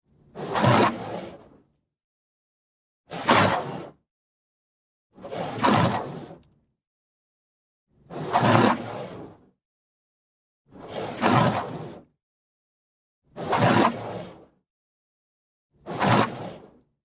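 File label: Water Spray Interior Car By Hard Med; Car Wash; Spraying; Big Room Sound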